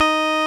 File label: Roxichord D#4, E.Piano; RMI; Keyboards of The 60's and 70's